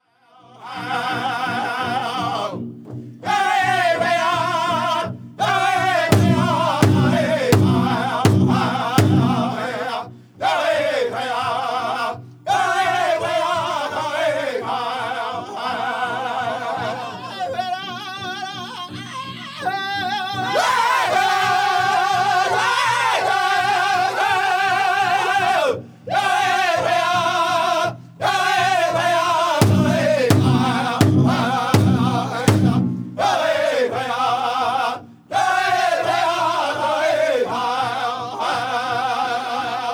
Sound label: Jingle Dress